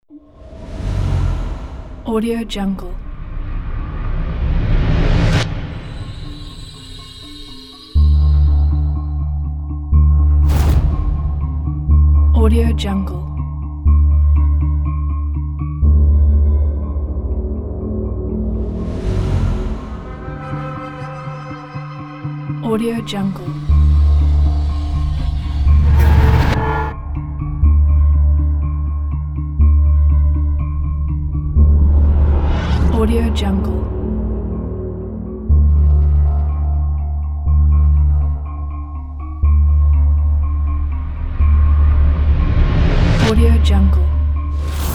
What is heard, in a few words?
haunting